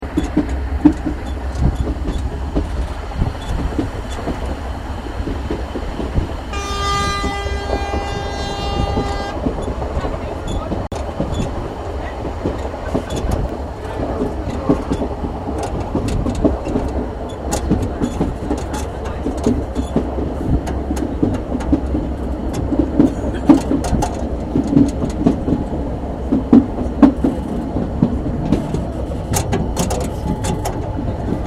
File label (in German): Langeoog Inselbahn